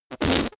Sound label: whoopee cushion fart